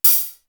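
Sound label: HALF OPEN, hi hat; CYMB.HH; East West; Global Sounds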